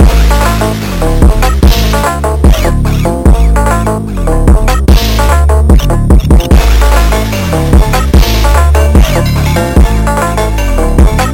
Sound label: (sped up), funk